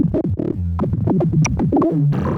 bassline seed; Data Broker

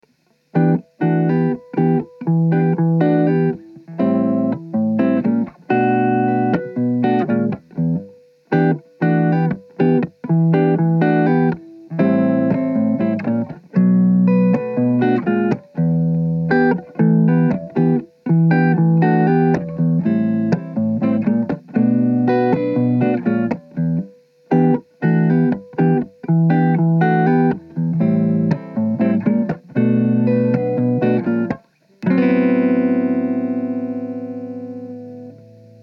Jazzy Lil Funk Groove, Em 120

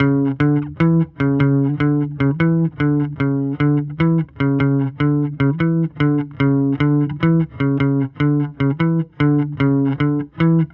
bass

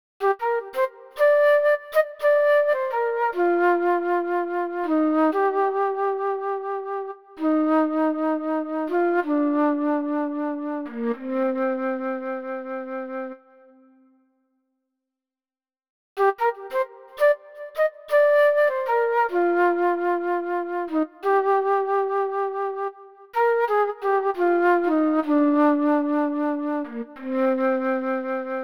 Flute

